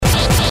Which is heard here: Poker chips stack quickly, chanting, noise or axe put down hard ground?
noise